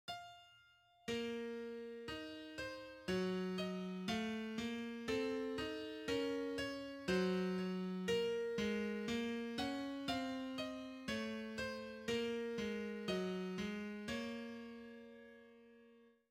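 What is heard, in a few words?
simple counterpoint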